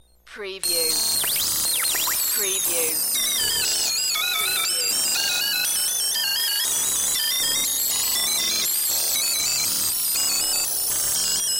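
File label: Robot Going Insane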